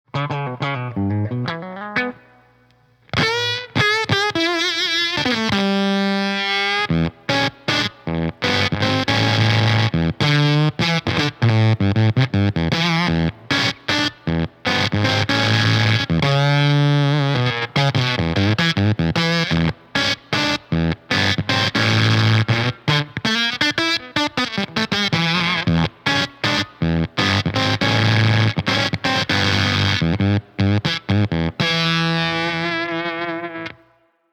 The Sag knob at Max and a good amount of Drive and Level create rootsy sputtering chaos.; Frog / Eyes